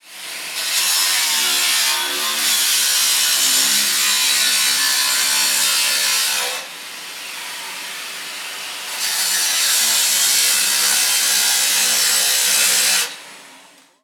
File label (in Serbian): Brusilica